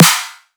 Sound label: snare smash E; drums; tonal; base pack